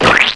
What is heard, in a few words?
Squish; PBALL95; 501 Great Games - Volume One; Guildhall Leisure Services